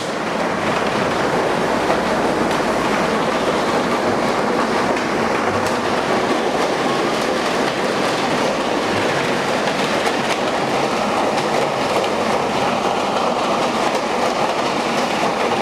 wasserrauschen pumpe

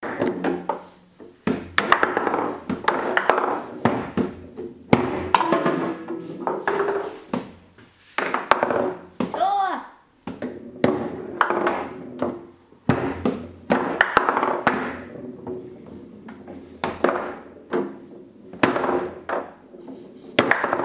kicker

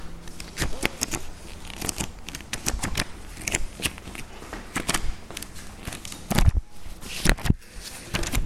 blaettern

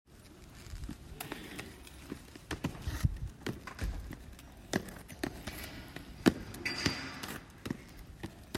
Inlineskates; Inlineskates auf dem Pausenhof.; Kategorie: Alltagsgeräusche; Rollen; Grundschule Bad Kötzting

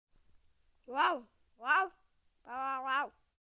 nachgestellter hund

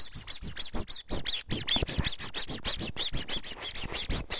quitschefrosch

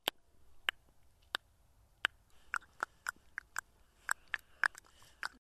schnalzen

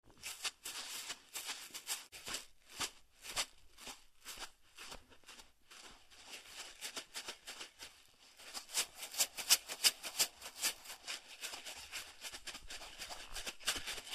wildes rascheln

